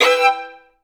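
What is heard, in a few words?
violins E4; Best Service; Peter Siedlaczek's Advanced Orchestra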